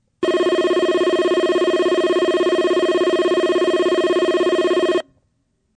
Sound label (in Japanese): 全ホーム旧接近ベル, 鳥栖駅